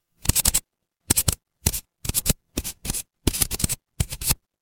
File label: office pen